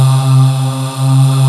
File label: Voick, VOICE; Best Service Gigapack 1